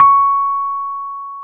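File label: Piano Rhodes; Club-50 - Foundations Roland; FRhodes2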